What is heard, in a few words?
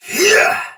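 Exertion Soldier Sword Agent Male, Battle; Sinecure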